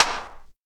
airy snare one shot d key; snare drum samples

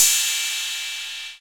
cymbal sample g sharp key

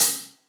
hat A sharp; Duration 0:01; drum single hits one shots

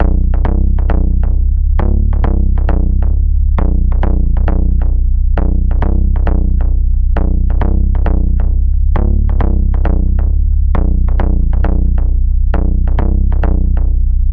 techno pitch bend bass B 134; hardgroove; loops

